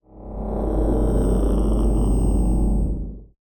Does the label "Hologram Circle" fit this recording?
Yes